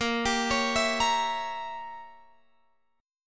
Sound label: Bb Diminished 7th Bb Guitar Open F; Bbdim7 arpeggiated